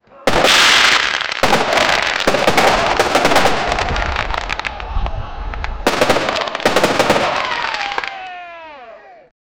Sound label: fireworks display finale